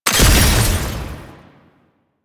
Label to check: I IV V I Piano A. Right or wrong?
Wrong